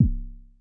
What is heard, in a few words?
kick, drums single hits; iElectribe Kicks